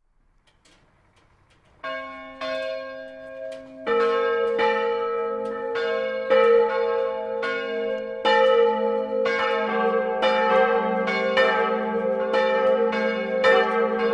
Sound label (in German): Kirche Glockenspiel; Ev. Kirche